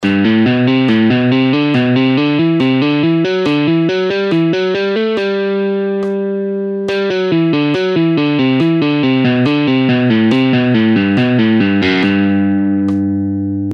Exercise 3 In Half Speed, guitar warm-up exercises